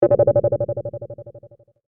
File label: Spaceship Sonar Ping; Science, Fiction; Spacecrafts